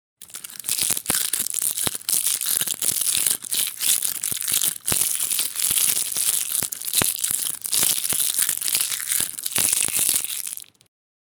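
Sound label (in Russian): звук шелеста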